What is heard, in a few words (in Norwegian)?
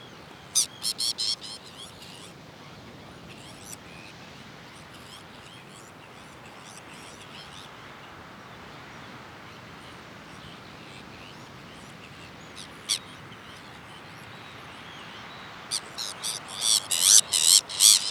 sabinemake, Jan Mayen; mono; Sabine's gull